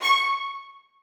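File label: 1st violins staccato c#6; Sonatina Symphonic Orchestra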